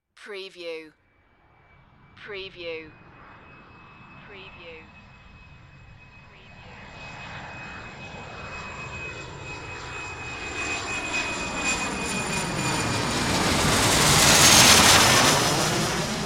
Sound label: Private Jet Pass By